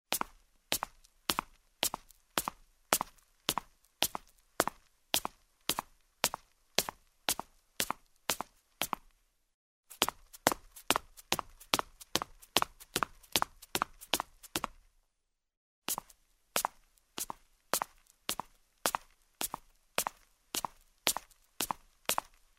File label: Steps on the marble staircase in shoes